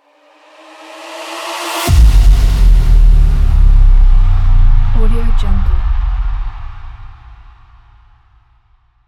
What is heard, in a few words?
impact slow trailer action; anderfast